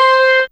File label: Gtar C5, Fairlight CMI IIe; Guitars